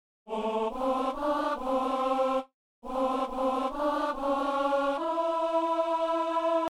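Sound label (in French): exauce nous seigneur de gloire, ténor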